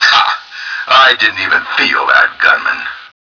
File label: boss hurt